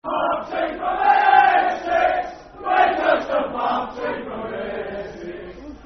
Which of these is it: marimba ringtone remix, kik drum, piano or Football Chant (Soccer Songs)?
Football Chant (Soccer Songs)